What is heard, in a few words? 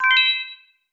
Fertilize Crop; zoo story; Android